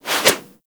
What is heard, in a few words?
Zipline Brake Foley; Gadgets; Parkour; Warframe